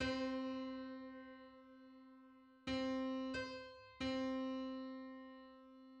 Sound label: Sixty third harmonic on C